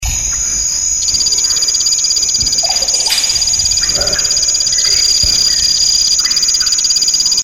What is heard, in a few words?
Phyllobates Lugubris; Dendrobatidae